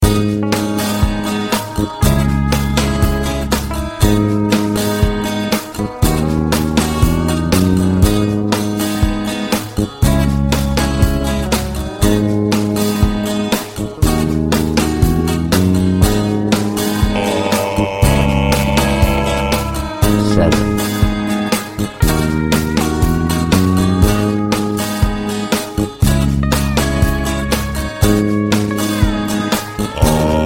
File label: Ab Aeolian, ear training; pure country